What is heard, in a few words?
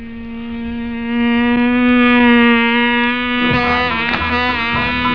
sax